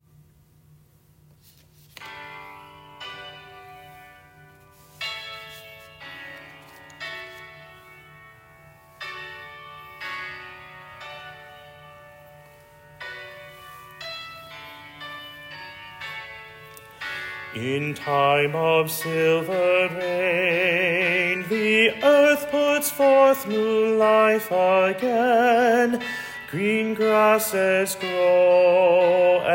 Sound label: bass